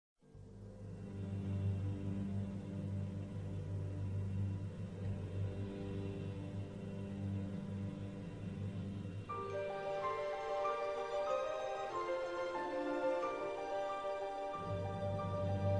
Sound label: instrumental no voice